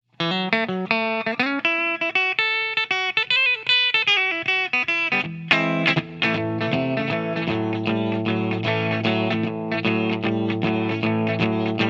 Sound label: Twang Clean Bridge